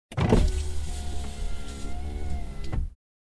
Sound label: Car interior electric window up